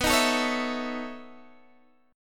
B 11th B Guitar DADGAD, chord

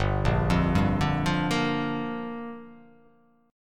G Minor 7th

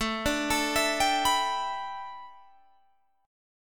A 7th Suspended 2nd Suspended 4th Guitar Open G

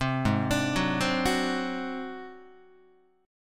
G sharp 7th Flat 5th G sharp down Guitar, chord; G#7b5; arpeggiated; 3,4,5,4,4,5; JGuitar

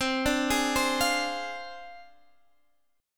D Minor 7th Flat 5th C, guitar chord

